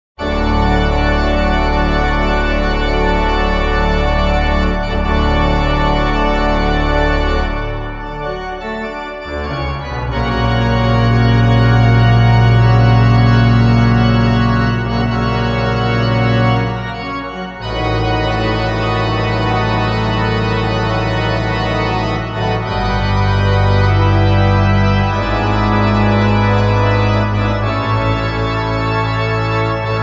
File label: Fanfare; Modartt 20-year anniversary